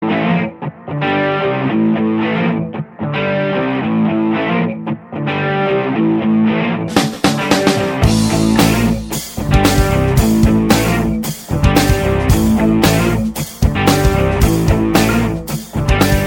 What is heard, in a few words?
rock on strumming ringtone